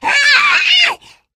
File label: spitter lurk, voice idle